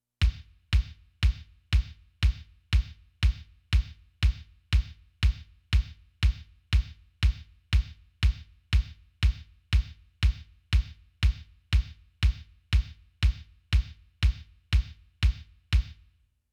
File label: Focusrite Kick Volume; Test Tones